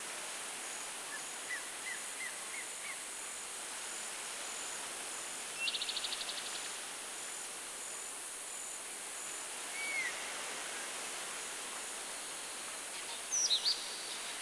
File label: Ambiance - Jungle